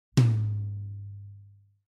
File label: medium tom